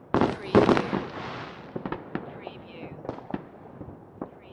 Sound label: Fireworks - Bang bang fizz; beanstalk audio